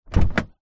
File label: transport car door open internal toyota corolla